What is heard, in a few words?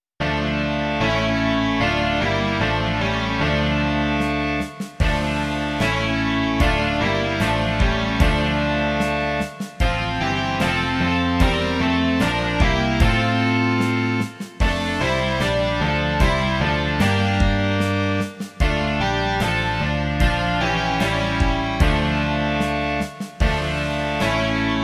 rock